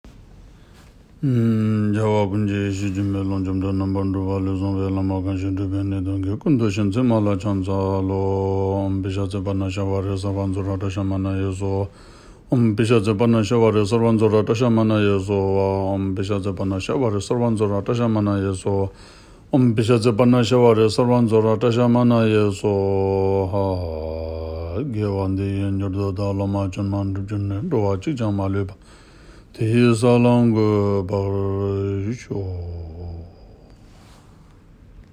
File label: parnashavari prayer